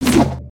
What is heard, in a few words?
Staff Spinjitzu; weapons